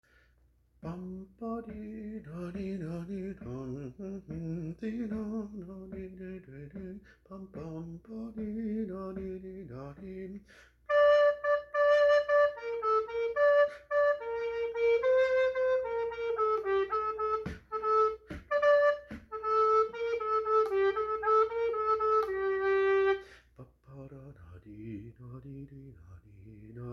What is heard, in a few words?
Tenor Parts I J, recorder